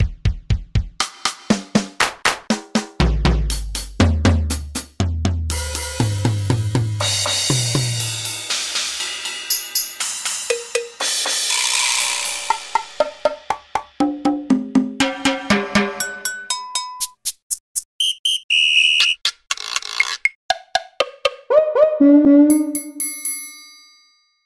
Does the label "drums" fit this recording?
Yes